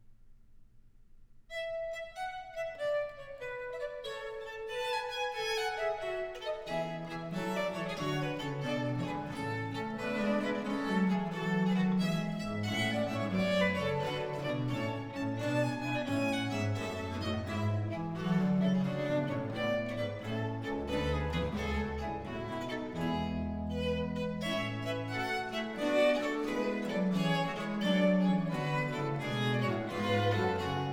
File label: Trio Sonata in B-Flat Major, Allegro; Purcell & Blow